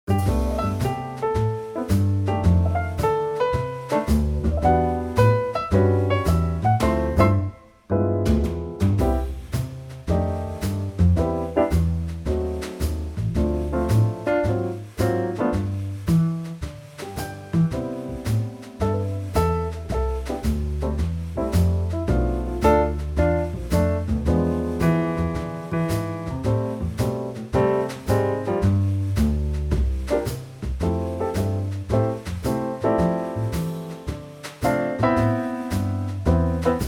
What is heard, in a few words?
C trio